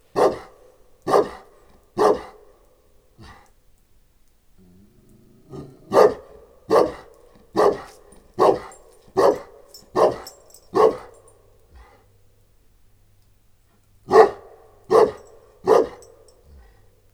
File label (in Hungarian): bernáthegyi moszkvai őrkutya keverék; eger turistahaz; parasztudvar